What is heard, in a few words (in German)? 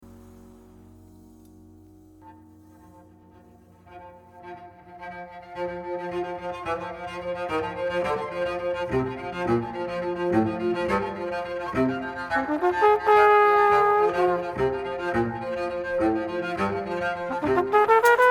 Bassriff gestrichen